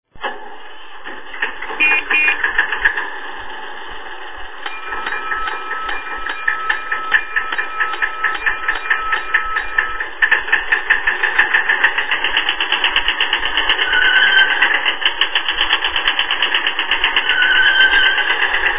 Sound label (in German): fuchsbagger, loksound